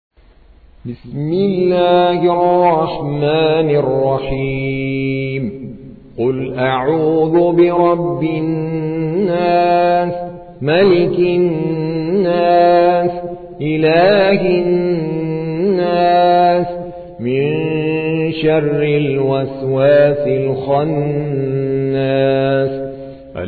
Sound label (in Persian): ترتیل قاری هندی; سوره ناس